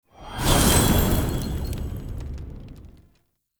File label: Idol Pickup; Objects; Pickups; Fenn